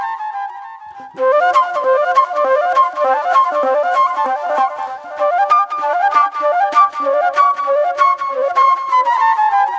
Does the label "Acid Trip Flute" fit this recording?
Yes